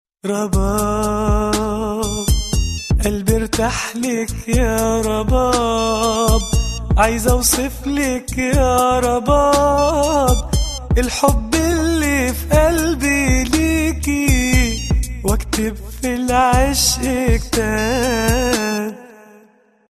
Rabab

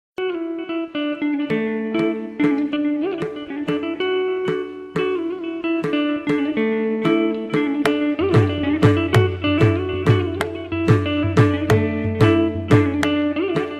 instrumental Music

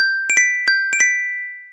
Xylophone Msg Tone

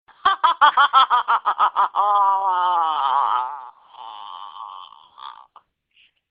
Evil Laugh

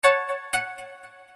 Message Tone

